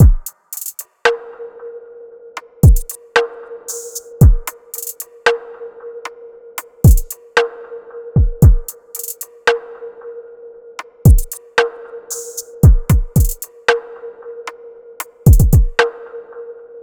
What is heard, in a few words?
Drum Loop 114 BPM, RnB; City Lights; Cymatics; ANALOG EVOLUTION Beta